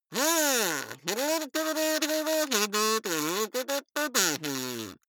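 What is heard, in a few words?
kazoo talk prattle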